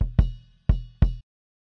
kick, drums

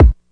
classic hip hop kick; STYLE KITS (Hip Hop, Etc); Classic Hip Hop Mega Kit

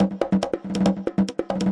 140 bongo roller

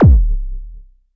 mbrute kk, Arturia MiniBrute; DRUM MACHINES

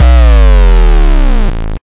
insane ouchy 808 blarp kick; Kicks; single hits

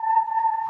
whirly tube A3

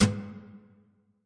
Nylon Hit, Guitar Body and String Hits

